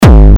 Hard Kick

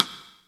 Rim Shot, X Drum LM8953; Drum Samples

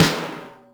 snaredrum, Yamaha RM 50

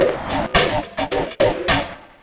Ha Ha Ha Loop; Sound FX

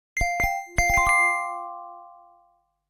Message; Nokia; Oro; Digital

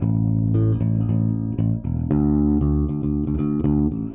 Bass Drum - Kicks

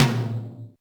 Yamaha RM 50 Toms; Drum Samples

